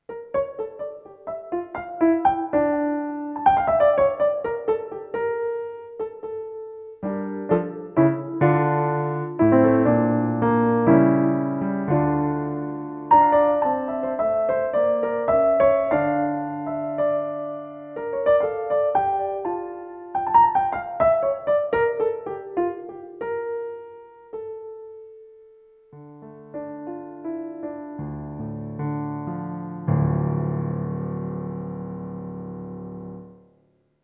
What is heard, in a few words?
Sony DRE 2000 Yamaha CP1 Piano Reverb